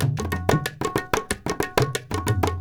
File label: India, Heart of Asia